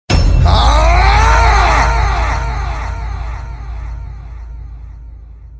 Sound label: DJ AHHHHHHHHHH